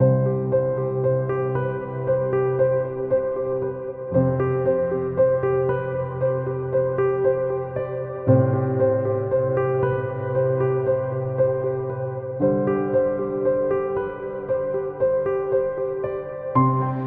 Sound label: Inspiring Piano; epic music vol 3